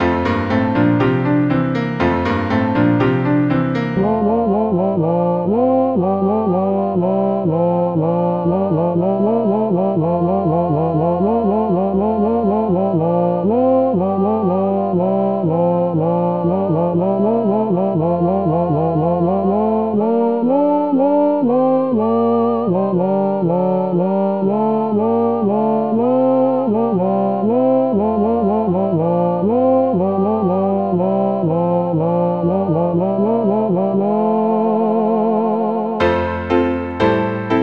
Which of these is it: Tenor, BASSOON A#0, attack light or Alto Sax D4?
Tenor